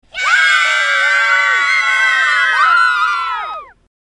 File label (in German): kinderschreien